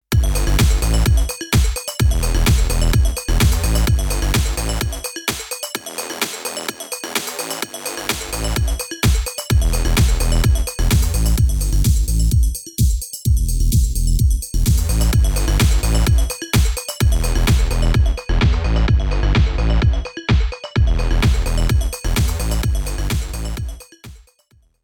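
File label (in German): Hörprobe djm-350 EQ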